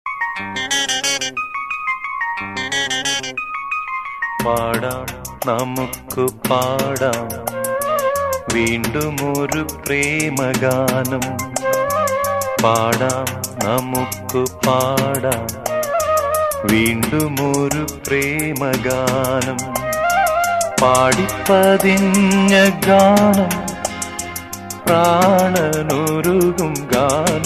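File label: munthiri paadam background music; blues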